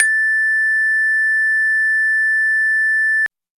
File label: Celesta; PictoChatSearcherSound; CelestaPost